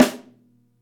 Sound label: Snare